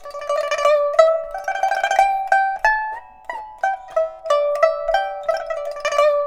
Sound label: PIPA, 90; INIS - Opium